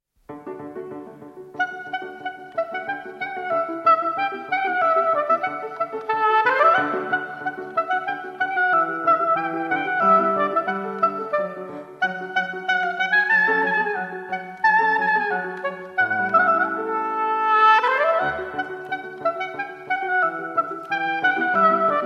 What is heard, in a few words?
oboe; Sibelius: Rondino